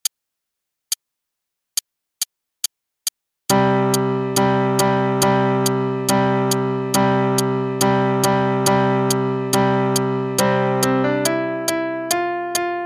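African Celebration (qn=140), Traditional African melody; Faber Adult All-in-One; Adult Book 1 (All-in-One)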